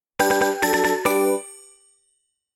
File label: Jingle Get Code; Pokemon Masters EX